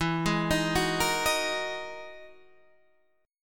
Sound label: E-Major Sharp 11th E, Guitar Half Step Down